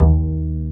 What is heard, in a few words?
BASS D#3; ORCH.COMP